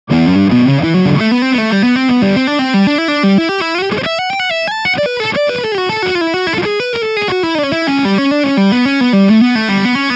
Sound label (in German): Leadsound Steg